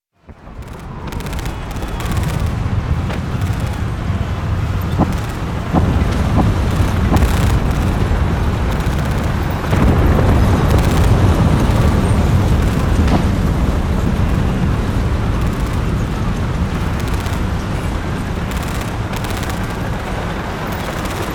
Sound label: Battle, World War II, sounds of guns and weapons; captain audio; fully loaded